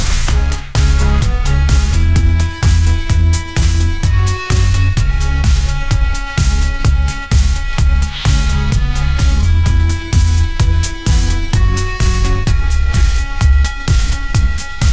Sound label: robot girl bass drop